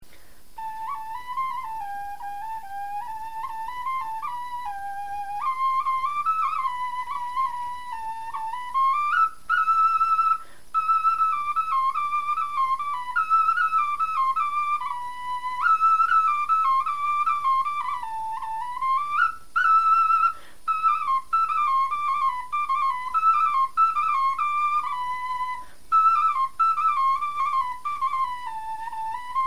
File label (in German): melodie, knochenfloeten